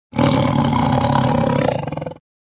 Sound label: Tiger Growl Long